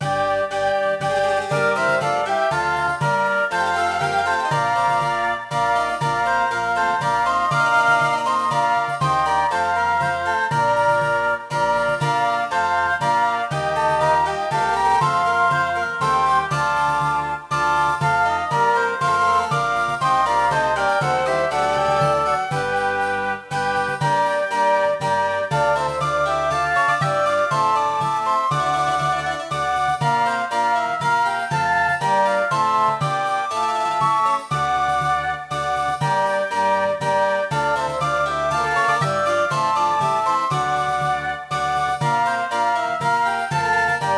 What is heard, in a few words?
dance normal; Sid Meiers Pirates; XBOX